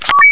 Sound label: vote pass